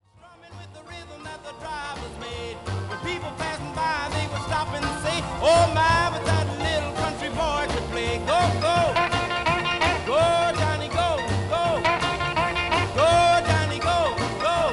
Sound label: GUITAR SOLOS